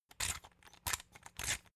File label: salt mill squeak sequence